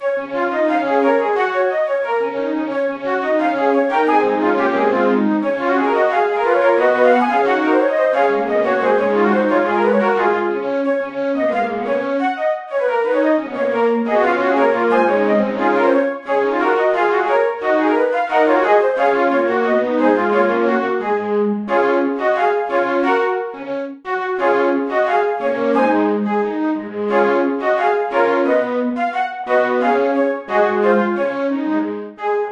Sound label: Flute; The Bard's Tale Remastered Trilogy